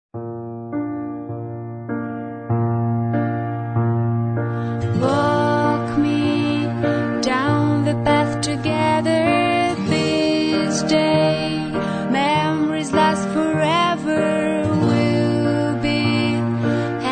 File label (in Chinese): 这是一首美丽、真挚的婚礼歌曲，浪漫而又轻松、轻松、欢快而又衷心，多愁善感而又令人振奋